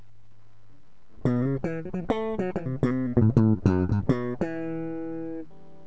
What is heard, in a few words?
tug electric